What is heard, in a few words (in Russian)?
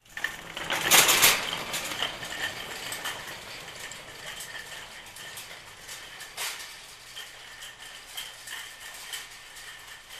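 звуки катания тележки в магазине.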